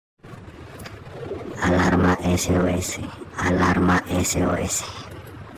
ALARMA SOS GPS

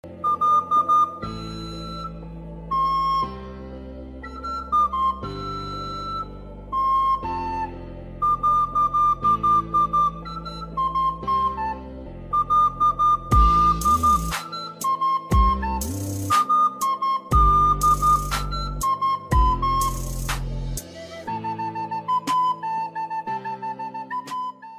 Flauta

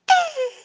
Tono mi novia

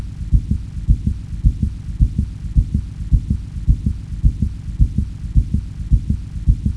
Latidos Del Corazon

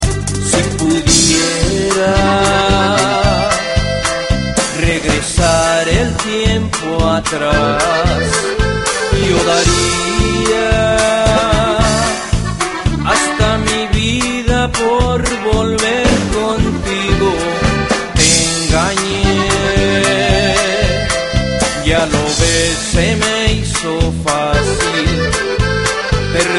Mensaje Norteno

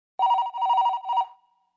Telefono Tono

